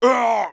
damage, monster; thiefboss3

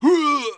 fall, monster; thiefboss3

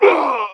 fall; monster

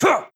combo, shaman, bell

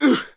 damage, sura, voice

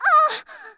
fall; shaman

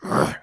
back, monster; maenghwan

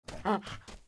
walk, monkey

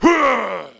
attack, monster; thiefboss3

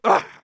damage, thief; thiefboss2

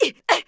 soft, assassin voice

